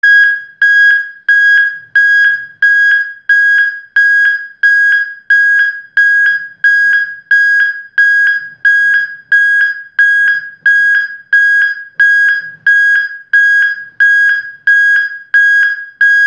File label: beeper